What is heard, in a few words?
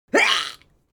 Male Death Cry